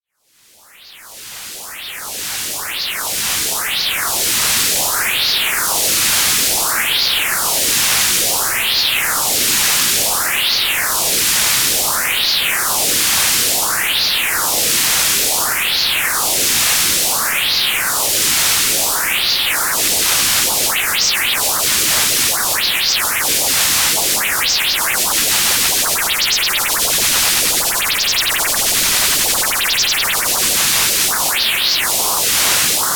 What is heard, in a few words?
phase shifter hp noise